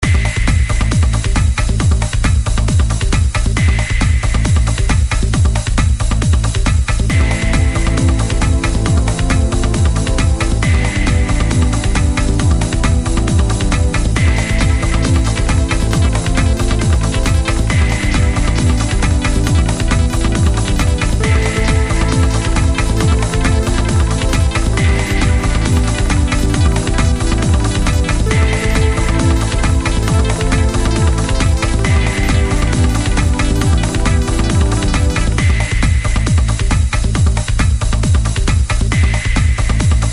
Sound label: Techno task